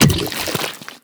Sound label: bite pull, barnacle creatures; Half Life Alyx